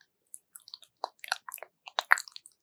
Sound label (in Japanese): くちゅ音, ASMR